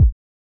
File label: Snares & Claps, One Shots